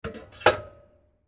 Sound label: popcorn popper pot lid open